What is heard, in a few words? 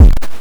Star Instruments Synare PS-1